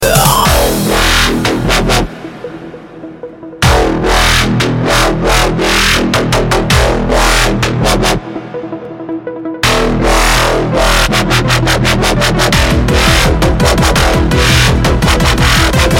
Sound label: Drum Bass; Nexans